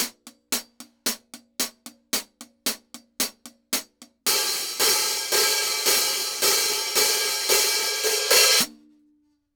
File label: Hi-Hats, cymbals